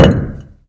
Stone Stone, Collision Sounds; clockwerk opensim

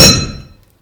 Stone Metal, collision sounds; clockwerk opensim

